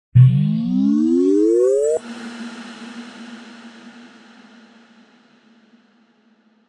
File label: Rhythm Village Energy Fill; Mountain SFX